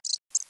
V shaped calls audible

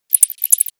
distress call audible

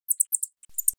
isolation calls audible

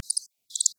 echolocation audible

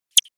alert call audible

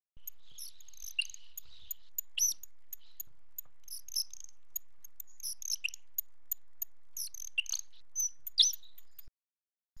courtship audible

